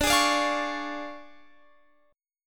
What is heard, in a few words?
D Minor Major 7th Flat 5th, D, Guitar Open F; DmM7b5; strummed